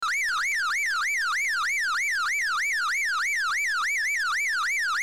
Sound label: sirene